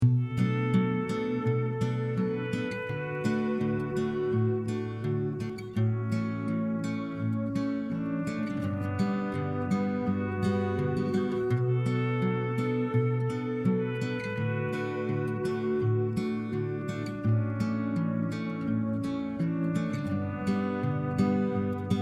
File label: Country ballad